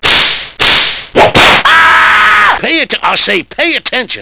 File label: whip him